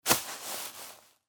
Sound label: ball drop in grass